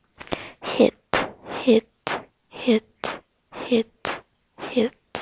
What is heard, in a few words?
hit